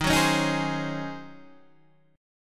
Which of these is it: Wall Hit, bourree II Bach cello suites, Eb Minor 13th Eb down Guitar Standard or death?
Eb Minor 13th Eb down Guitar Standard